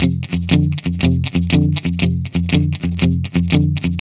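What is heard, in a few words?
120 F, Vintage Blues Guitar rhythm; USB Soundscan vol.22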